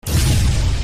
spell sfx

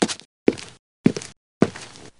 generic foot sound

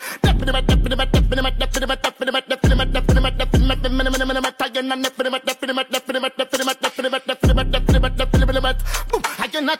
rastaman spit

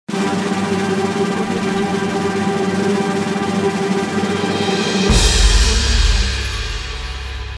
drum roll suspense

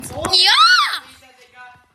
yahhh

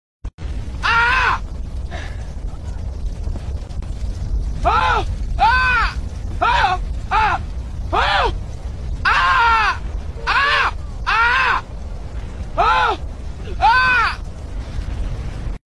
screaming

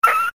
cry; sabrewulf

